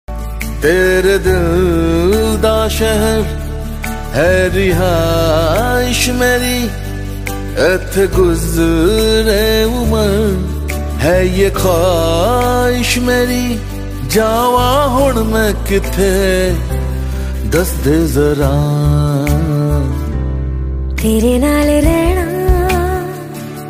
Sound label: Punjabi Song Ringtone